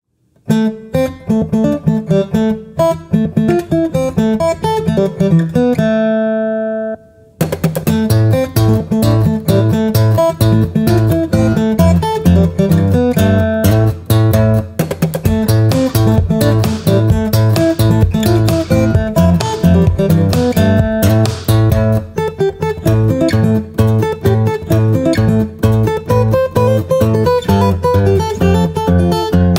classical guitarist

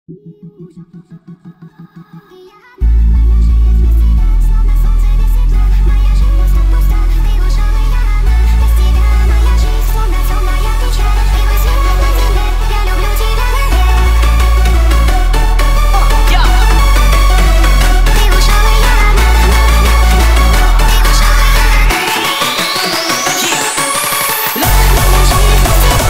hardstyle remix

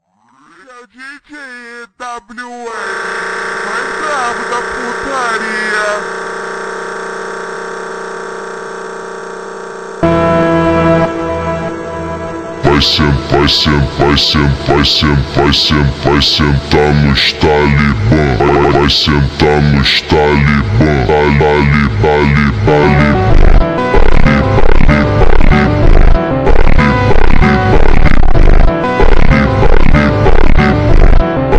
ultra slowed funk

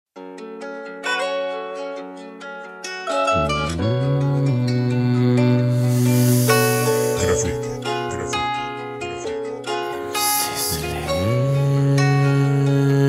rap

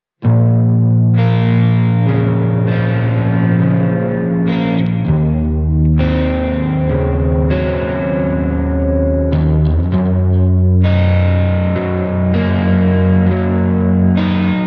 slowed n reverb

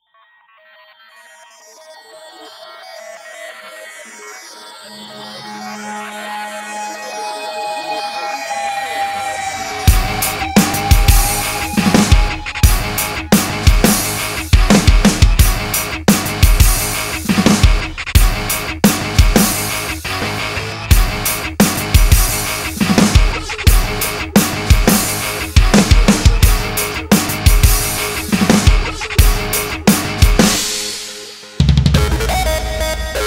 drum cover

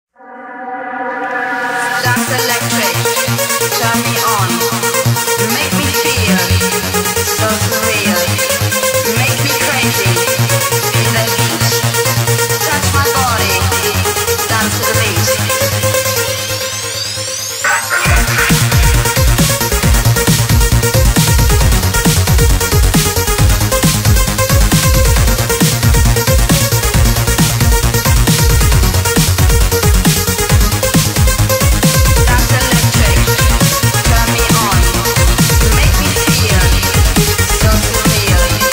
electro freestyle

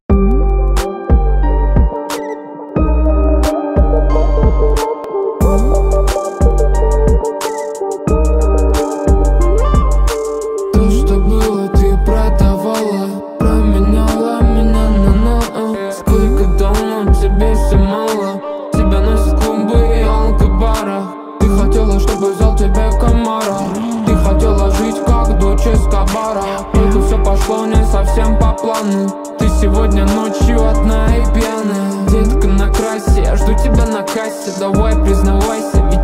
dark bass remix

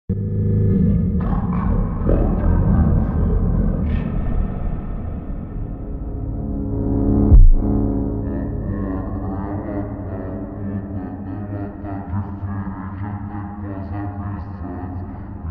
montagem super slowed reverb

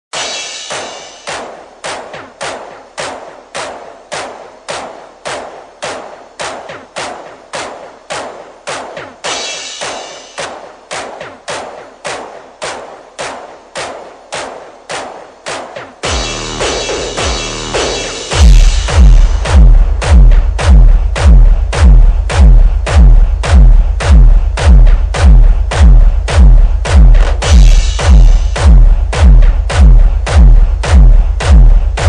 slowed bass boosted

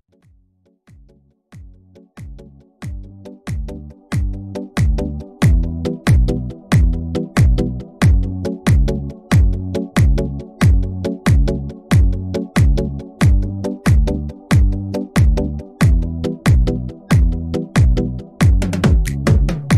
bass music

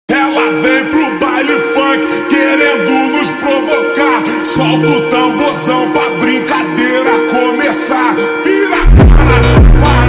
funk slowed reverb

